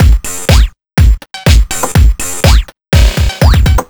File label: Drums, 123bpm, Nu Dance Electro; Combi Loops; Zero-G; Soundclash; Acidized Wavs; Pet Shop Toys